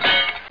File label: Clank; Jackson's Alert Sounds